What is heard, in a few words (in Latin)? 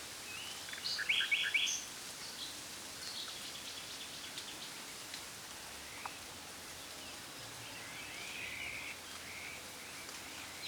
Luscinia megarhynchos; SETE